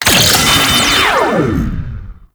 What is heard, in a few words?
Rescue Captive Free; Tau; Twelve Minute War; Stealth Rescue